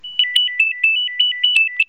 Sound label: nokia3510i samba rumble ringtone